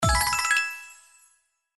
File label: win Scatter; Sugar Town